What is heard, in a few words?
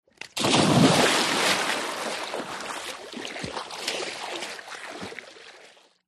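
Splash Cannonball Dive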